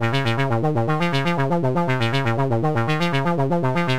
Breaker Bass; MrYear2123

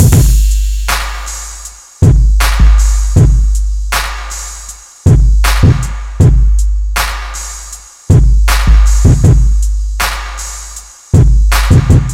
Drums HEAVY HITTIN; BonesRecordz

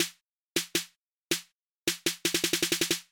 Crunk Snare Roll; DJSongz

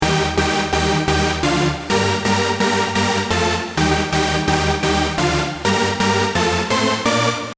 mega lead

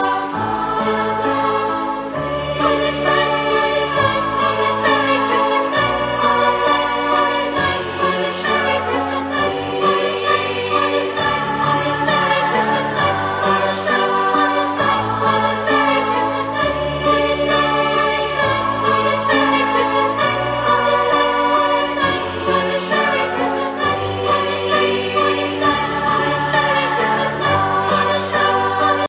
ChristmasCanon

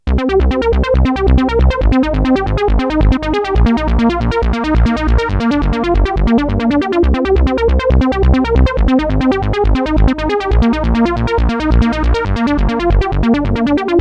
anomaly synth; djlucas

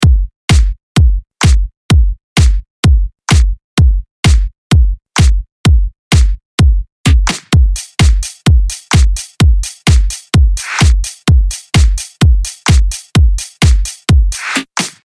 electro house loop

